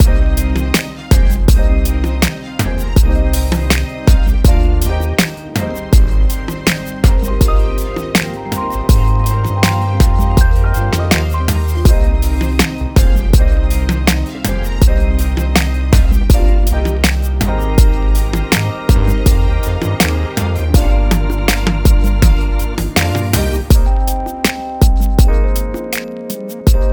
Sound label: 081 Cmin, Smooth RnB